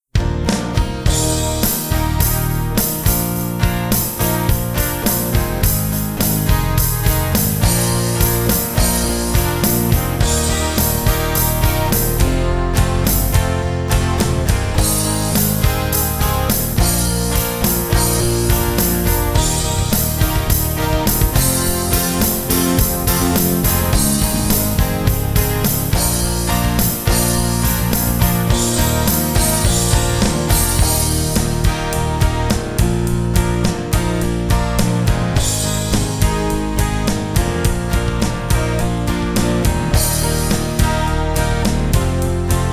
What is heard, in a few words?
guitars